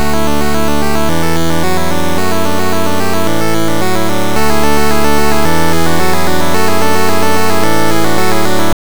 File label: piano